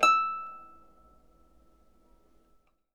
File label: Harp E6; Miroslav Vitous - Percussion & Harp